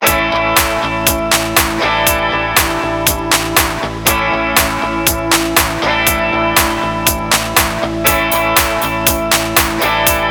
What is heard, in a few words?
ringtone